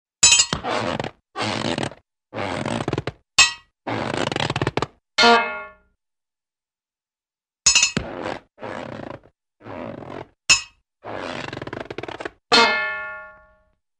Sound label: Metal Clank Followed By Rubbery Stretches; Hollywood Edge Cartoon Trax